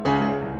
Then comes the two chords that are always with pedal in.; Beethoven Piano Sonata No. 14 Moonlight Sonata